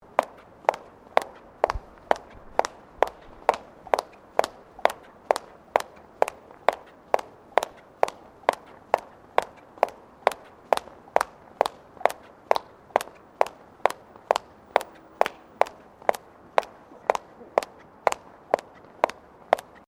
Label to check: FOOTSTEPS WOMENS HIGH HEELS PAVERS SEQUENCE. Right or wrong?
Right